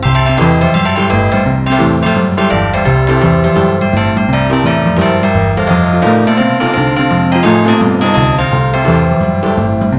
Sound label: Boogie Bugle